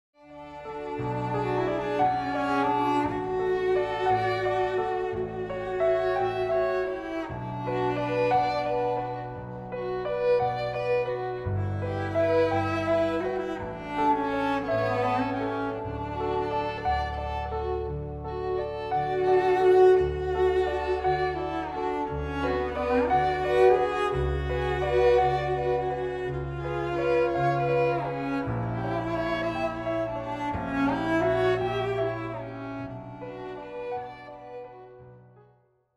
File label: Piano Trio